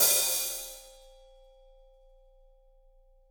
HH, Drums; Bob Clearmountain Drums I

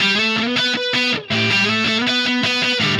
Happy Pub Guitar

